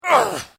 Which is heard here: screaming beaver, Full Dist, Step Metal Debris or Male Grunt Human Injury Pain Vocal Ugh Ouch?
Male Grunt Human Injury Pain Vocal Ugh Ouch